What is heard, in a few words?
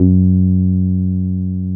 TB 303